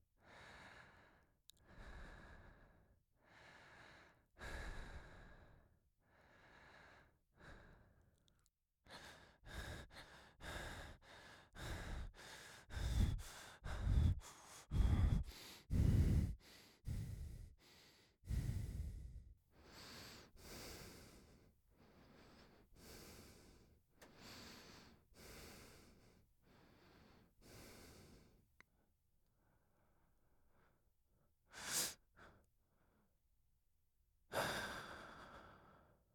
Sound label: Labored Male Breathing